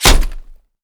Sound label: impact helmet; Escape from Tarkov